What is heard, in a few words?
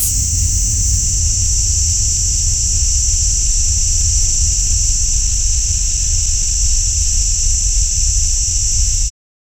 loud energy beam sound effect